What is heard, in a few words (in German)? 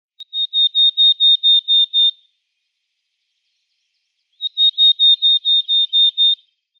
Alpenmeise Parus montanus montanus; Vogelstimmen